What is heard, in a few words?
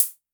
More hats, Hi-Hats - High; LIMAT Div Dept; Drums